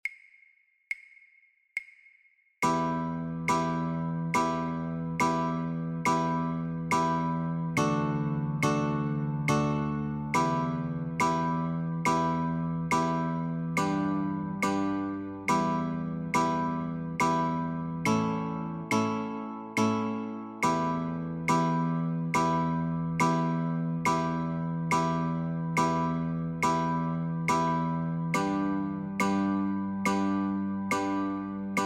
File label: Chords, guitar; Scarborough Fair